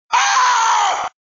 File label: DAAAAWWWWWW; Nogla